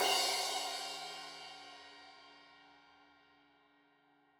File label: Crash 15in L edge, Cymbals Stereo